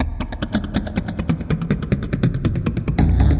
141 descending click phasers; 141 to 160 bpm

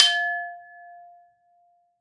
Glass Hit; UNUSUAL KITS and FX; ModeAudio

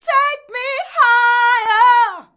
diva take me higher; Cheesy Divas; VOCALS and SPOKEN WORD

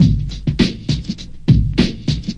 101 bpm lowpass catch, drum loops and breaks

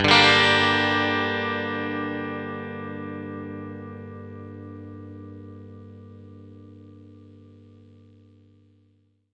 clean chord Gm, clean electric guitar chords

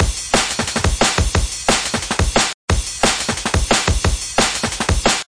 178 dnb beat; remixable collections

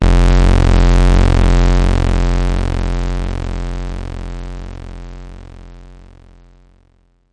bass F1; instruments multisampled

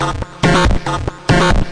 140 bpm hexbeat

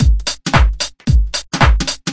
112 garage swing

